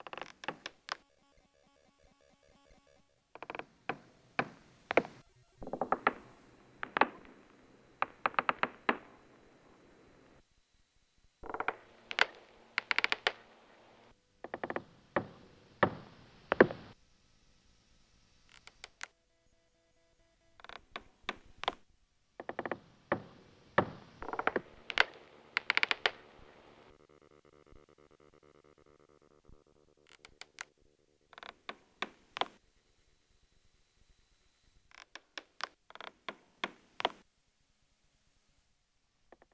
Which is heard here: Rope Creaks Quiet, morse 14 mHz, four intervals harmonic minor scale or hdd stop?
Rope Creaks Quiet